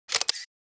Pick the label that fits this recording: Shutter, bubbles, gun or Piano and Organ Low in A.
Shutter